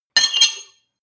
cutlery on plate cut squeak hard short, gastronomie; berufe; soundpool